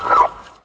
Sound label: ping, turret floor; hl2